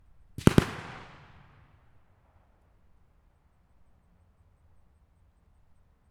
M1918 Browning Automatic Rifle .30cal in front Double shots